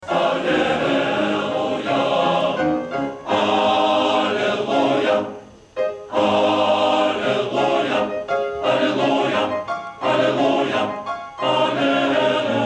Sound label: male choir, haleluja; male choir of mephi; ershovo